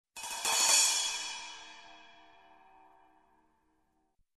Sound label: Ride Swell